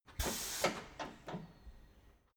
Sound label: tire changing machine hydraulic separator short burst